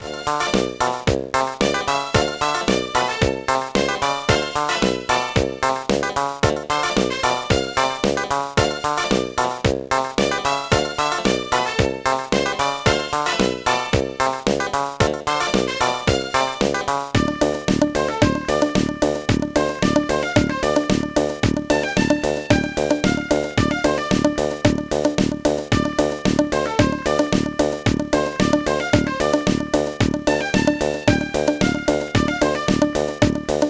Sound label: Scrub A Dub ~Carwash~; Shark Tale